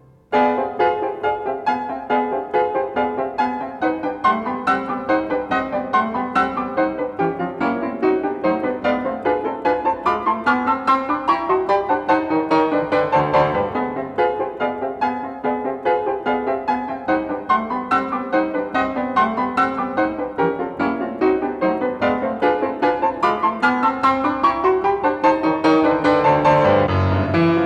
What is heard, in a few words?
Allegro, C major, piano; Beethoven; Diabelli Variations in C major, op.120; Brilliant Complete Beethoven Edition